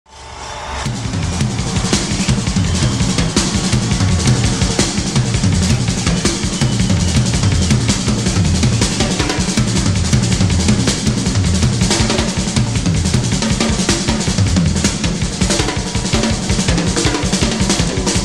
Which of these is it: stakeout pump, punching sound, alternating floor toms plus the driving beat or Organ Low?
alternating floor toms plus the driving beat